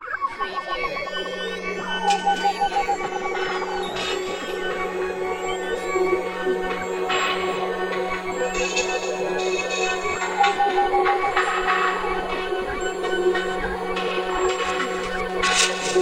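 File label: Production Element Bed Digital; Sound Effects Bible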